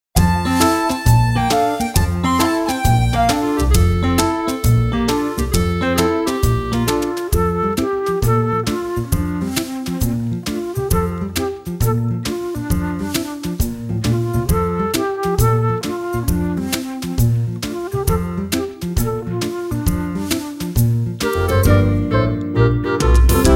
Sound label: Backing